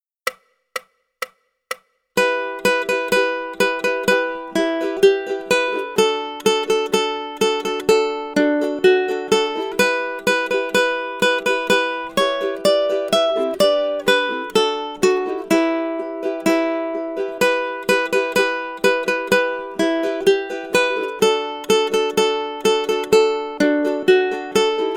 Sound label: Drunken Sailor, ukulele; grade2